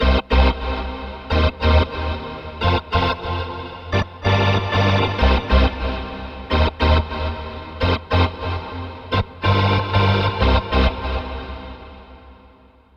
Ditty

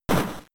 Tackle; pokemon; gen 3; attack moves

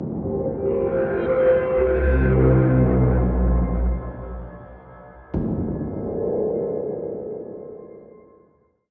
Coop Lose

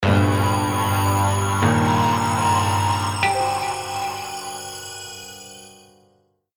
Jingle Boss Fight Intro Jingle

